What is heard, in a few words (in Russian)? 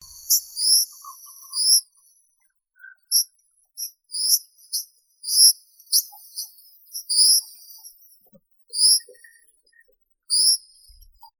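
бурая оляпка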